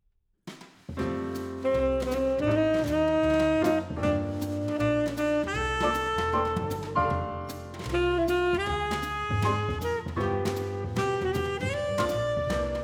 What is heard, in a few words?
Electro-Voice Re 2000, microphone; Das Mikrofon, Vol. 2; The History of the Condenser Microphone